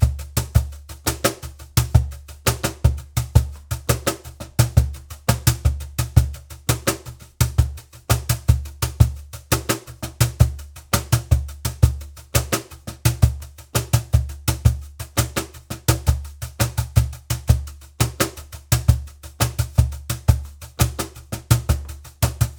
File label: 170bpm Cajon pan left